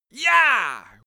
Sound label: male cheer victory; schreie; menschen